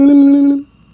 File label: tickle